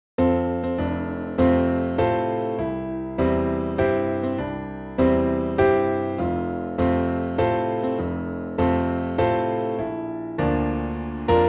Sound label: Happy Birthday F Major piano